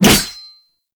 Whoosh, Weapons; Infested; Coda Mire

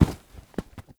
Foam Block Impact; Ambience; Gameplay; Warframe; Nineteen Ninety Nine

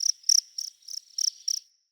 Prairie Day Close Cricket

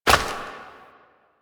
Step Flesh; Warframe; Koumei

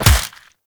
Melee Fist Impact Flesh; Fists

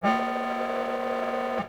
Animal Foundry Working, Machines; Liset Machines; Tenno

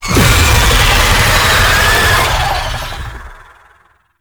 Boss Vocal Spider Summon; Enemies; Warframe; Oraxia

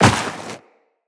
Walk Snow, Footsteps; Characters; Tenno

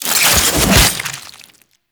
Kuva Lich Finisher Save Chest; Warframe

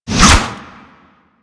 Whip Whoosh; Warframe; Weapons; Corpus

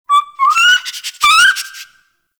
Rabbit Ambient Call; Warframe; Duviri

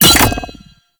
Grenade Bounce; Warframe; Lasrian Soldiers; Weapons; Lasrian Goonadier Grenade

